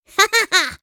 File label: laugh; Teemo